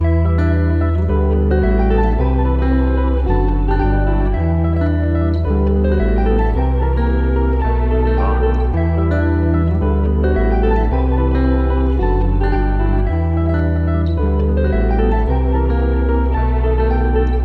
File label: 110bpm Full Mix Em